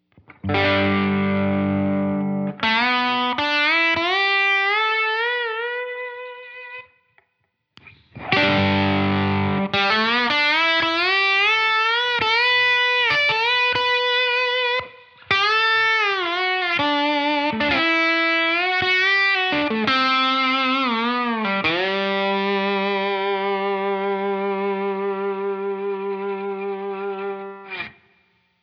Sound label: guitar gain boost slide tone